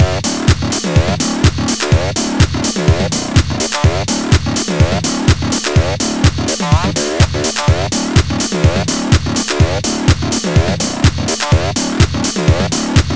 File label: Banging Chorus, Acid; 100 acid vol 2